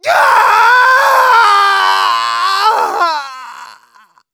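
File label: pain critical death, de; Scout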